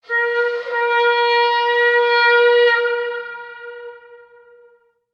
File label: Horn; chivalry medieval warfare 2012 pc